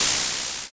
tire skid

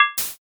basket

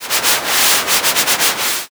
river

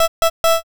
tententen

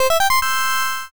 game clear

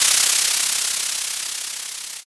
soda

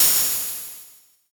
cymbal

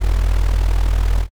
vibrate

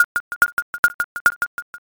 thinking time

